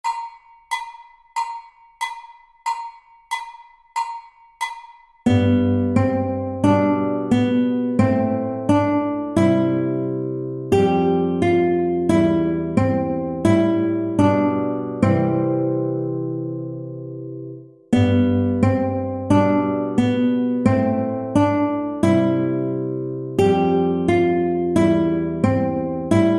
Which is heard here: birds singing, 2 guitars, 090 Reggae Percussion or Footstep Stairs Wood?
2 guitars